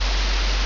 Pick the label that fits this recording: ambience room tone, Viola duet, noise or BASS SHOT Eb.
ambience room tone